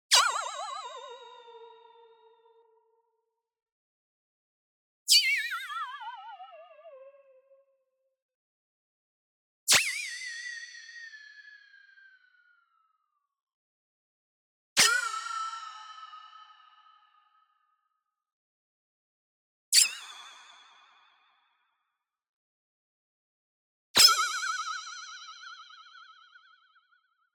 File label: Burst Freq Shift Moves; ZAP; boom library; Anime Essentials; DSGNMisc